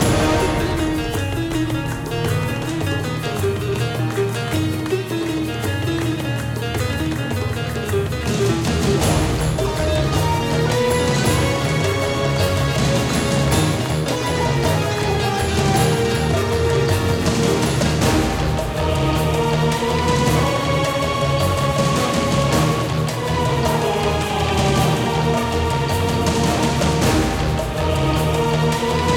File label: Music Loop; Choplifter HD